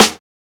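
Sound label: raw snare drum A key, acoustic snare tuned to the A note; snare-drum-samples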